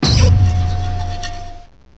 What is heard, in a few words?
scream tail, cries; direct sound samples